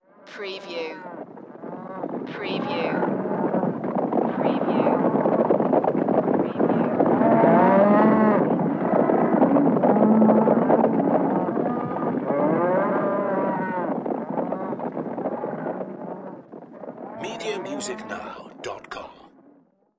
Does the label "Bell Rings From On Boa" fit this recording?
No